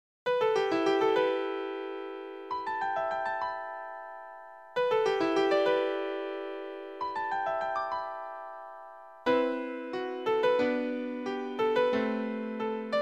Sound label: flute in twilight